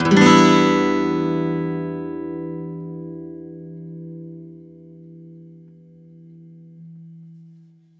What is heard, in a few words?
E min downstroke, Acoustic Guitar; E min7 downstroke; Sonic Foundry (Sony Creative Software); Songwriter Acoustic Guitar Companion; One Shot Chords